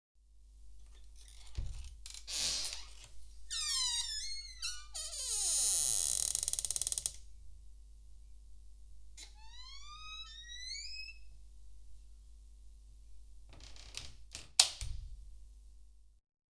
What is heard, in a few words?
Creaky Door